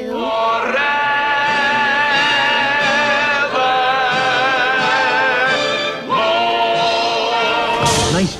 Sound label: forever more SUNG